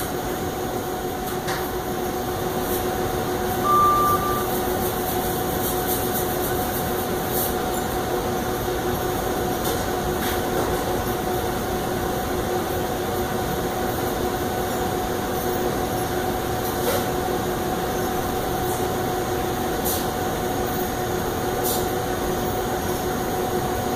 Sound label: background noise loop